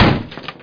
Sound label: collision med; SpecOps2